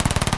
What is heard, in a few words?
Machine Pistol Long Burst; bursts